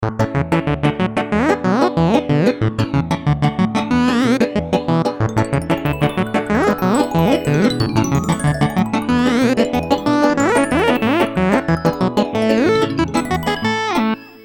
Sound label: airplane